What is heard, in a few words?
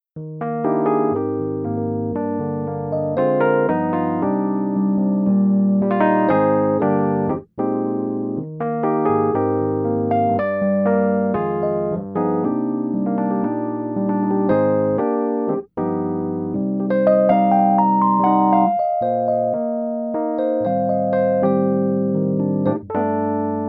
Rhodes Full